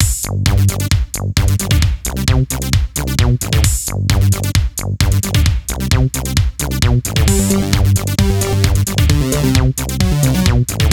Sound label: Videogame